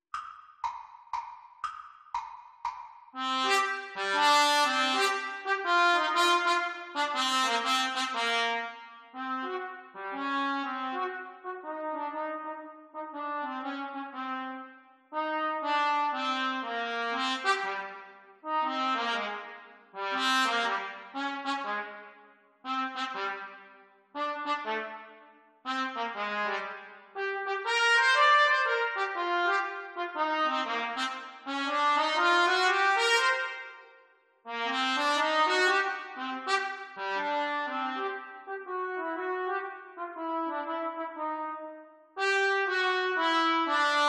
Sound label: Trumpet Duets Exam Speed; Grade 6; Cornet Duets